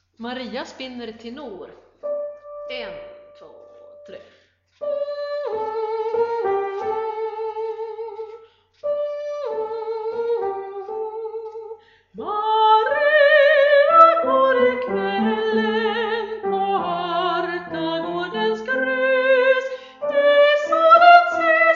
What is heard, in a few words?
tenor; Maria spinner